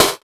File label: Big Beat Drumloops; USB Soundscan vol.25; AKAI